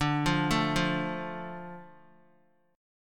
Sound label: D Minor D Guitar; Dm arpeggiated; x,5,5,2,5,x; JGuitar